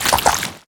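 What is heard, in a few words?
Dungeon; Etrian Odyssey III HD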